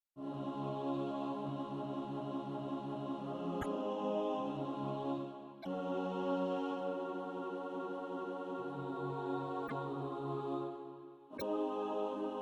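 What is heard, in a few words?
alto, SATB Practise Music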